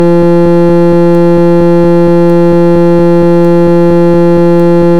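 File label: pulsar 5.7 ms; standing on a crunchy star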